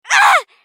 女･被ダメージ; マスケッティア

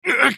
被ダメージ; ナジブ

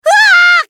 ダウン; ビキ

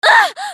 ウォーロック女･被ダメージ

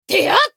攻撃; 迅雷のアドリアナ

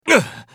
被ダメージ; エデュアルド

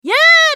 攻撃長; カトリーヌ(知能型)

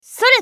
攻撃短; カトリーヌ(筋力型)

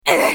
被ダメージ; グラングマ

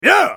攻撃 短; ナル

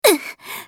被ダメージ; ソソ

